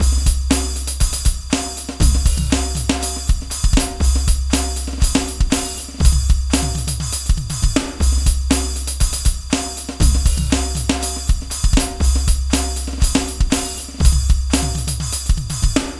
120 breakbeat electro perc

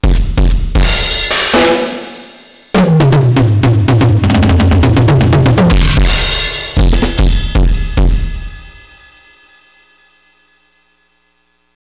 heavy killer break 120

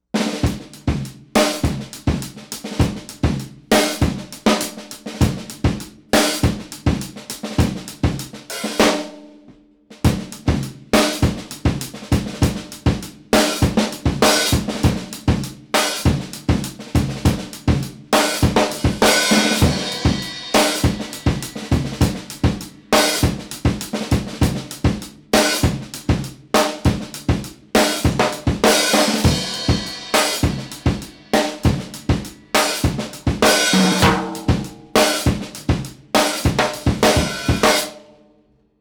beat 100, acoustic

